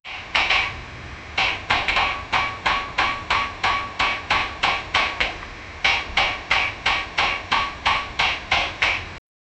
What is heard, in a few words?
mecha giant robot jumpin